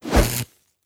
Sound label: stunstick swing; weapons